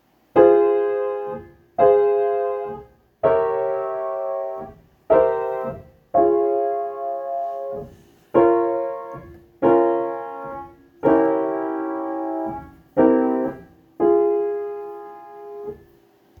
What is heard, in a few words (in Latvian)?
Akordu secība fa minorā; Mūzikas mācību priekšmetu pedagogu veidotie metodiskie materiāli